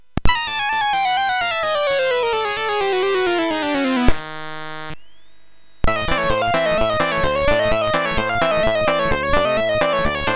Buzzzzz sid MOS6581R3

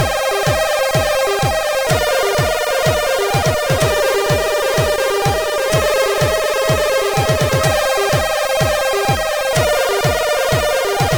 sid, CSG8580

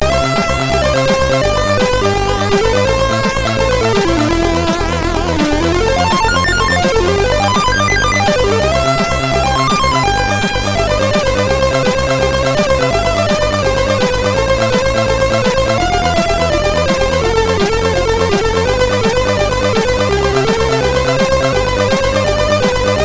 sid MOS6581R3

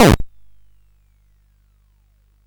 Frantic, CSG8580R5

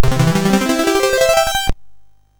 Fire Breath, MOS6581R3

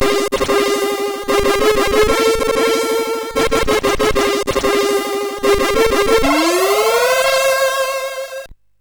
sid MOS6581R3, GAMES; Abyss

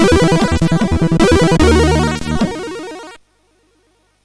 sid MOS6581R4; Trance Sector

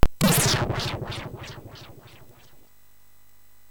Fuzzball, MOS6581R3

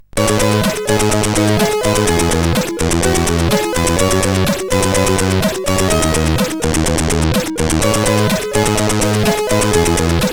Intro, sid CSG8580R5

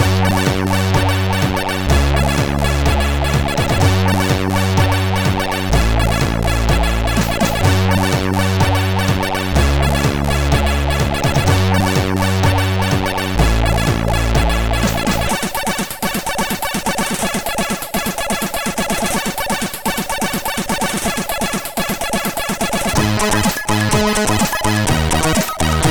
Intro, CSG8580R5; hvsc

